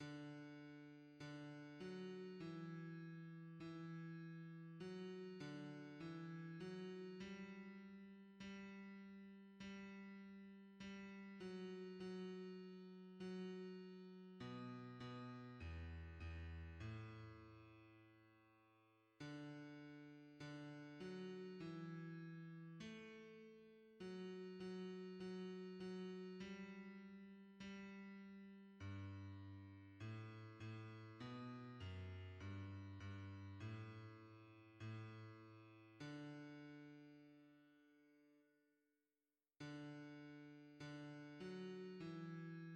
bass, hymns; Seigneur Jesus source de toute grace

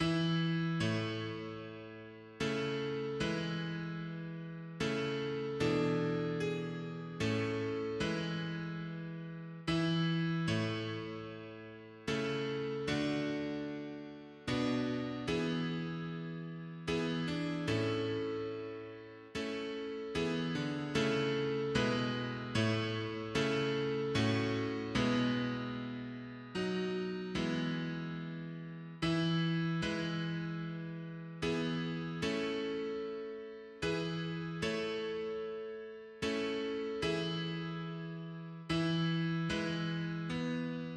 mono piano, hymns; A celui qui nous a sauves

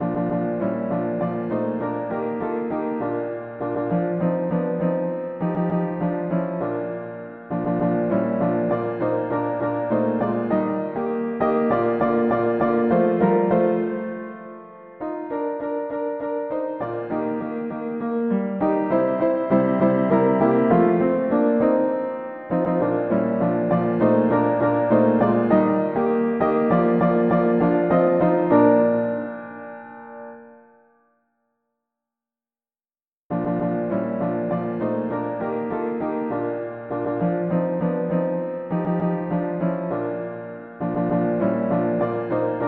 polyinstru; A la porte dun coeur; hymns

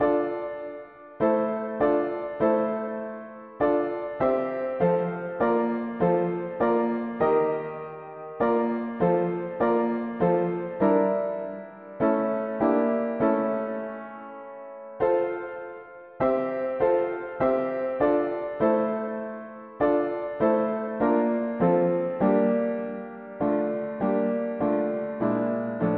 mono piano, hymns

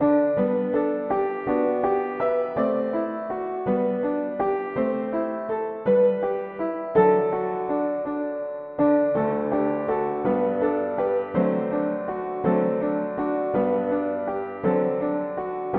mono piano; hymns; En toi j ai trouve

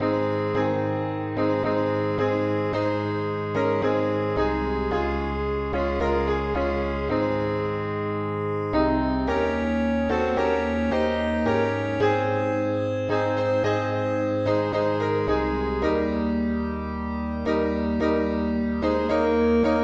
polyinstru; Dans une marche sainte; hymns

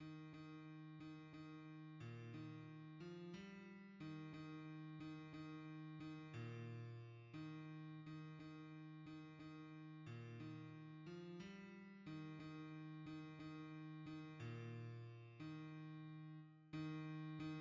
bass, hymns; O vous qui n avez pas la paix

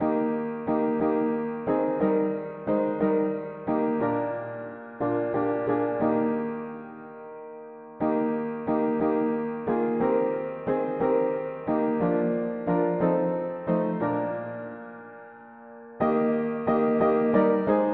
mono piano; hymns

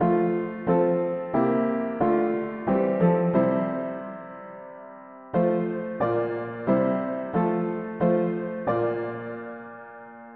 mono-piano, hymns; O patient spotless one

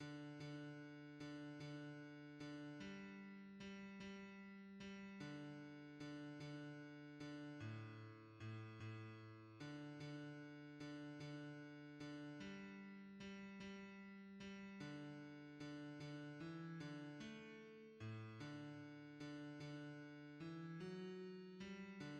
bass; O Dieu supreme est ta grandeur; hymns

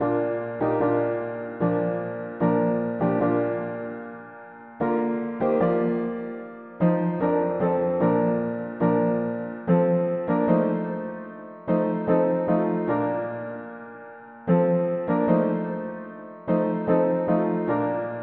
mono piano; Nous benissons ton nom saint; hymns

